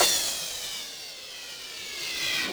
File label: CYMBAL, Yamaha RM 50